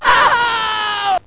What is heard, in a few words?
"aaah!"